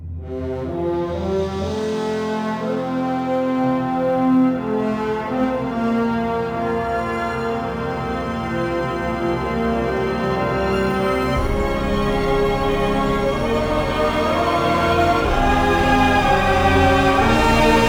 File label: Planet Start Earth; Planetary Annihilation